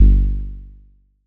lo-fi muffled bass pluck; 15s

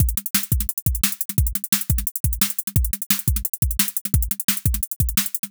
fast drum and bass breakbeat 174bpm

